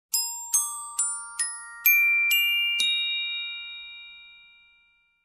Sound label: Happy glockenspiel melody variant